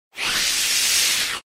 vampire hiss

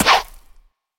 jump

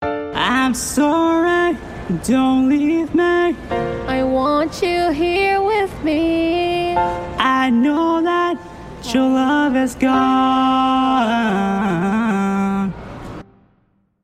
singing

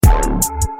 dun

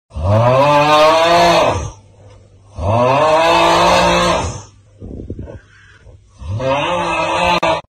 aaaughh

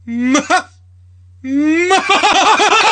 mwhahhahaha

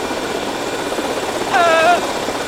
ahhhh; gtavc

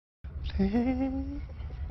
hehehe

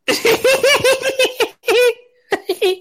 hihihih

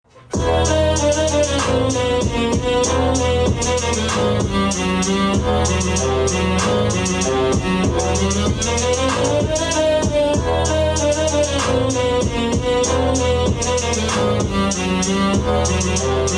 carnival ahh beat

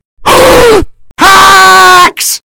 gasp haaaaaaaaaax

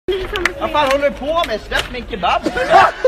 slap

